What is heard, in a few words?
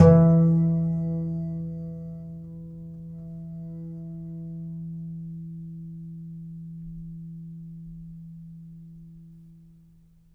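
Contrabass Balalaika, Plucked Strings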